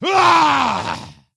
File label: attack; Skadi The Ruthless